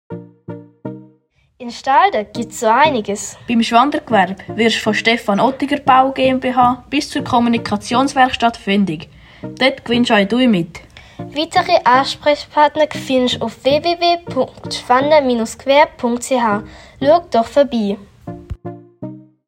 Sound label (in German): Jingle; Stefan Baumann GmbH Kommunikationswerkstatt